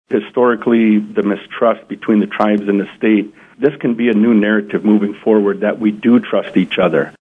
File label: flute